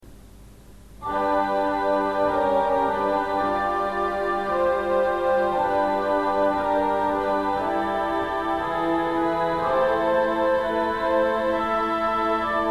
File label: Vox coelest 8